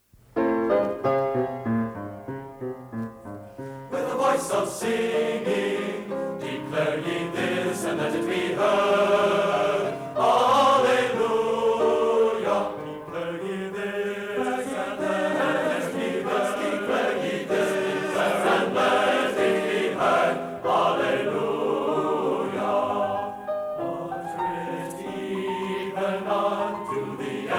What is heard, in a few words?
With A Voice Of Singing